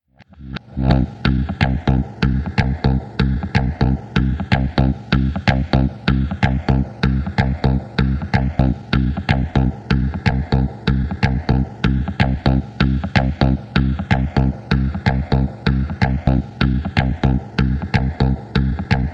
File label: start round, music; hotlinemiami